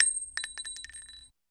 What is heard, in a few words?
shell, g2contender; weapons